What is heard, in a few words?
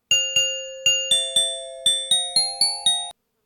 Diving eagle